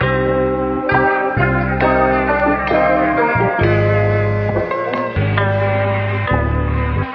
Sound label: keys, jazz loops